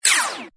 Laser Beam

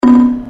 Bump Loud

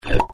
Suck Pop

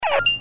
Oops Shrt

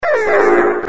Oou Long

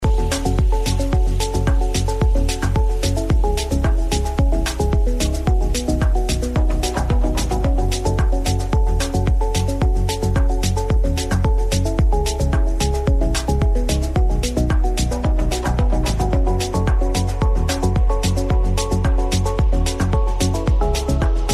Snd Xms Key

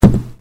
Knock

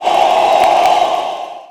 Crowd

